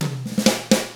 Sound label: 127 SURF ROCK